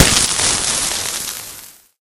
concrete impact flare; source engine; physics; video games

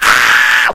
squeal, infected die; Left 4 Dead 2; Source Engine

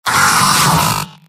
pain severe; demoman; video games; source engine; tf; mvm

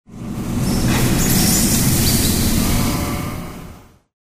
tone birds; video games; ambient; Source Engine; tf; lair

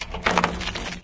stalker shakes; ambient; source engine; episodic

